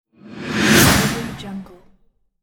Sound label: swoosh